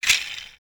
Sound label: toycar, toys; CosmicRage